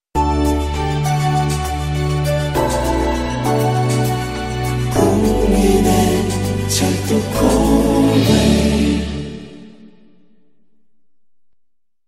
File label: logo song